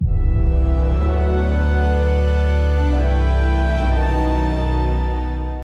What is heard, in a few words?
Stinger